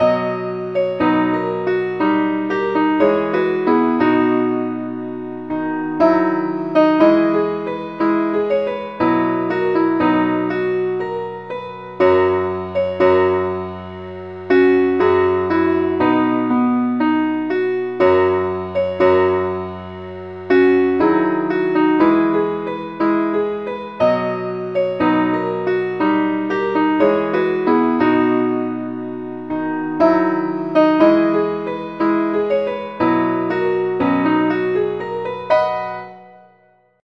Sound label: keyboard Only key E